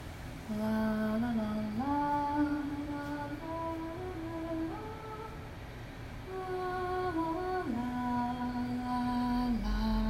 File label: Singing